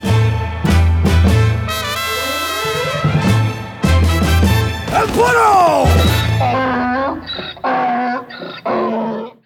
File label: Mule Kick Perk-a-Cola Sting; Moon; Call of Duty Zombies; Treyarch 2008